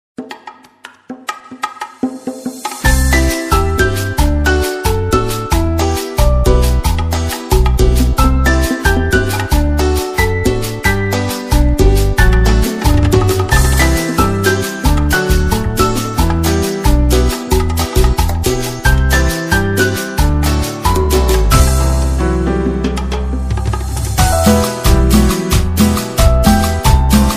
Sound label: cumbia; Naruto SaS